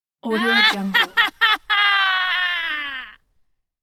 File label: evil woman laughing